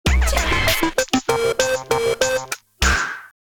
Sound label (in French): Comedie link; Jingles medley